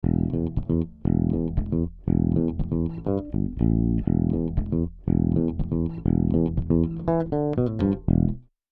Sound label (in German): AMS Neve 33609N E Bass 2 zu 1 Leichte Kompression max. Reduktion 3 dB